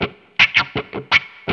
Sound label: WAH LICK, Distortion Gtr; PlatinumPhattCD2; E-MU Producer Series Vol. 8 – Platinum Phatt